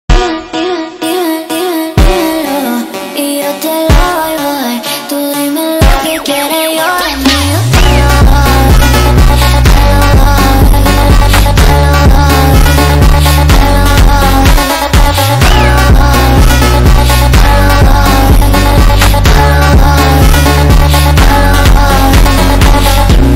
Phonk MONTAGEM

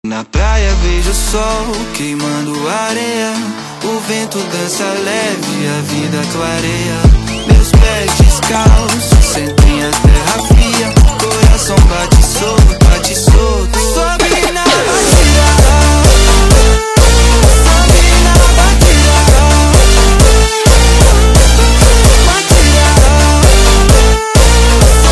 Phonk MONTAGEM BATIDA JUMPSTYLE

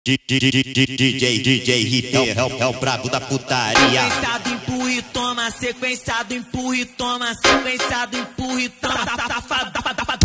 Phonk MONTAGEM

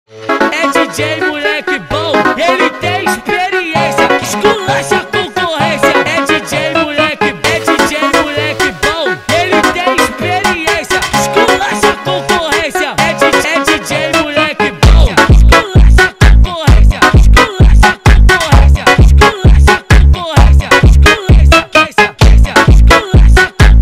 Phonk funk

